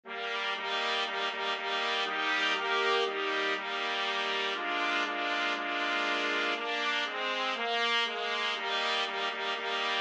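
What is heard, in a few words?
4 trumpets; trumpet quartet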